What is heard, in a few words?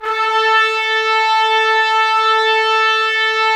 Trumpets; Roland - LA Composer vol. 4